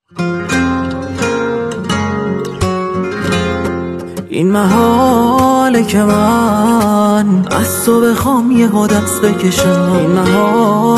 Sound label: TapTap virgin Guitar remix